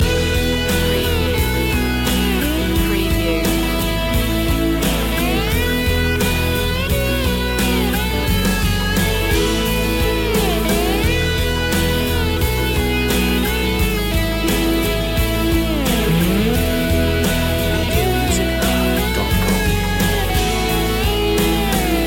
melancholic day loop

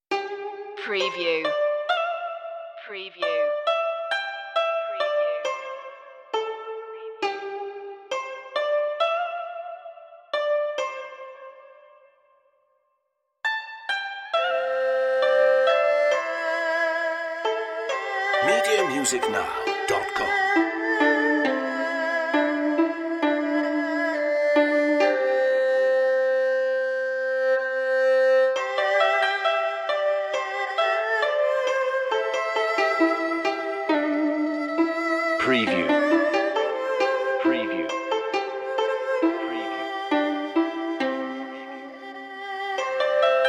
white lotus solo inst only

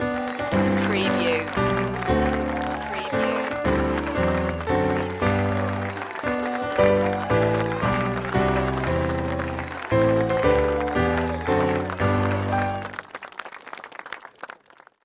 happy birthday piano lively applause

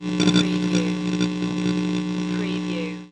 Electric Interference

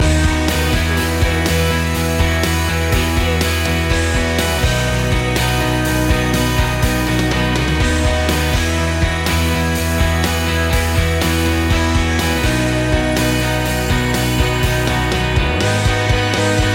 released to fly loop

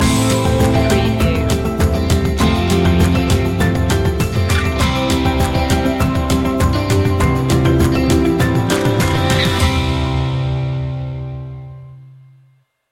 walk together no vocals

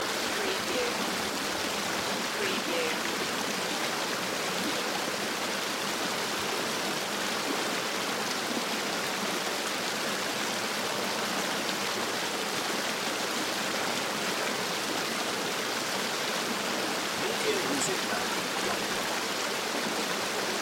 River Loop

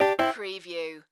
Casino Electronic Sound Two Tone

